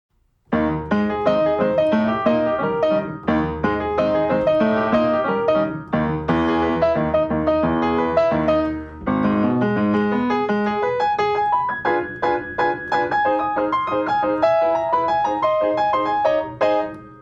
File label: Maple Leaf Rag